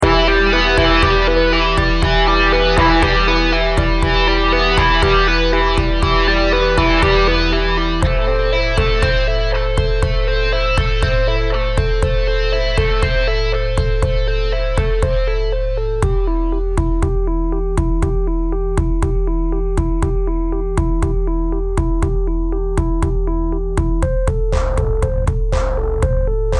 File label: Rock